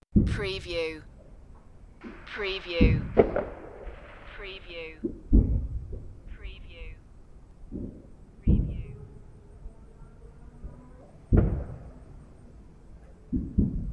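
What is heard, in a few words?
Fireworks Distant; Firework sound effects: firecrackers, bangers, bonfire crackling and other firework explos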